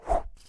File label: snowball; tuxpaint; magic